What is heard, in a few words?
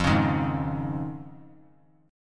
F Minor 7th Sharp 5th; JGuitar